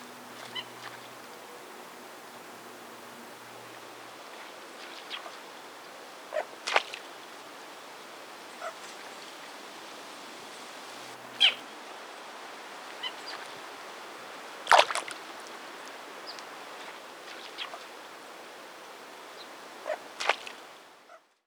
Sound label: Rana catesbeiana